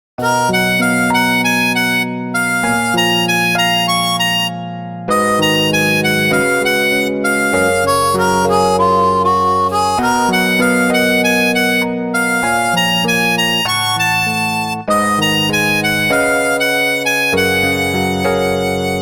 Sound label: instrumental